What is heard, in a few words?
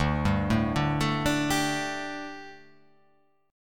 D Suspended 4th D